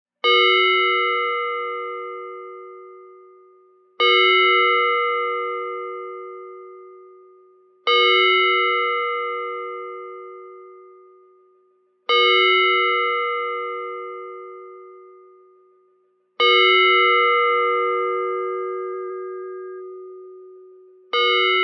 Bell rings, synthesized. Clean, harbors & marinas buoys